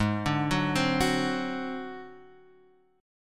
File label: G sharp 7th Flat 5th G sharp, down